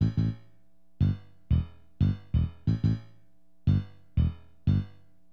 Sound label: BASS, HipHop; LiveAct Pool 1